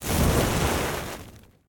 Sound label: ignite Burn; World Of Goo; Fuse